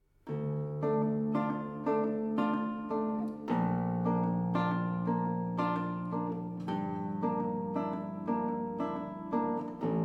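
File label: 10 string guitar; HAUSER; Staendchen; Schubert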